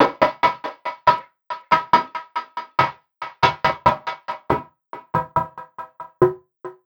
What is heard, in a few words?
Envelope Beat; Drums & Drones